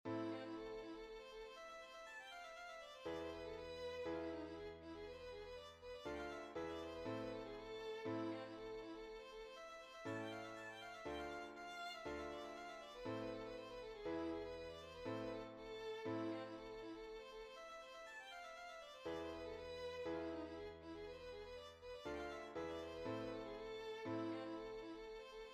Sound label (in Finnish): polkka